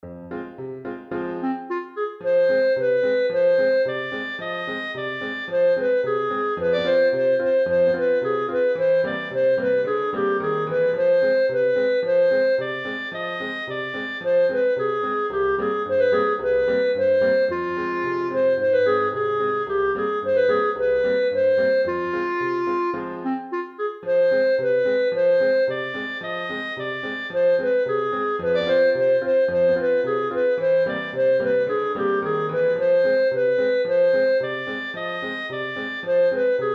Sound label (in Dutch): Bulgar met klarinet; Bulgar from Odessa